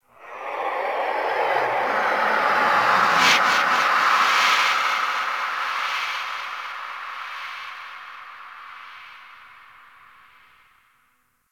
Chamber Whisper; Orokin; Warframe; Sacrifice; Ambience

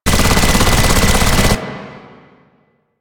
Spec Ops Minigun Agent Fire Loop

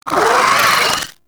Vocal Attack; Warframe; Moa Satyr

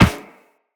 Run Metal Perforated, Footsteps; Characters; Operator

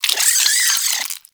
Vocal Death, Hunting Critters; Nexifera

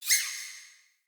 Vocal Single Squeak, Animals; Player; Player Floppet

